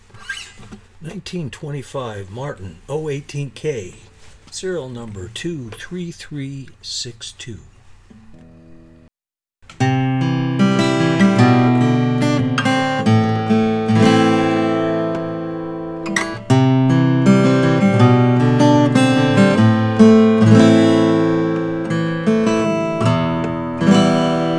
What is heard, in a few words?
martin 0 18k